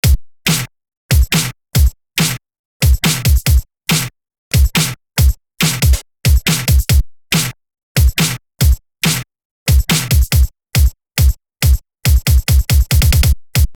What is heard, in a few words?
Breaks ( Drum Loops)( no percussion)